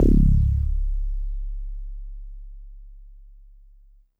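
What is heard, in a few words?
Mono Bass DX100 C#2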